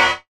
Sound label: Percussive Hit, contact mic